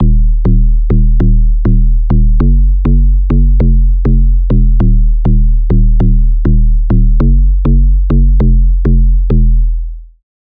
Bass; Birmingham; Routed Sound; Spire Expansion - Noble Gangster Vol.1

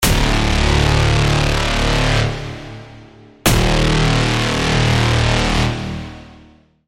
Braam; Braam - Black Armor; Routed Sound; Serum 2 Expansion - Quantum Enforcer Vol.1

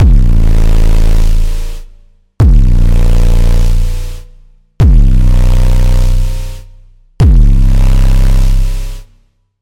Industrial Impact; Routed Sound; Vital Expansion - Doom Carpenter Vol.1

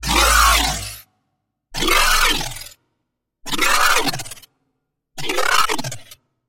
Growl; Slaughter; Routed Sound; Serum 2 Expansion - Chaos Bringer Vol.1

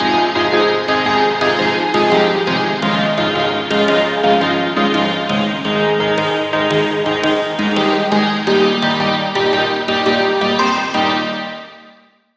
Lead, Serum 2; Heaven Scream; Routed Sound; Serum 2 Expansion - Flame Summoner Vol.1

